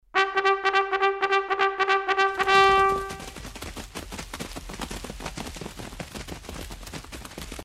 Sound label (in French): Sonnerie Au Trot